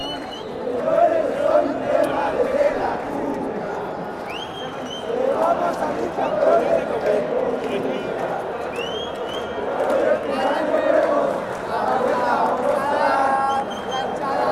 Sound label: dale campeon, chants